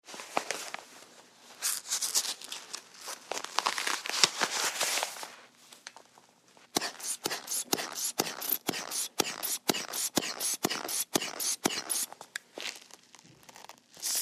HOSPITAL EQUIPMENT HOSPITAL: Sphygmometer blood pressure test, cuff on arm, pump, release air, repeat twice, rip off cuff.